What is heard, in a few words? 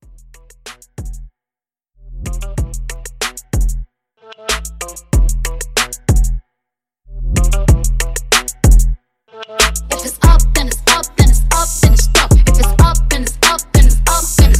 Clean Mashup 94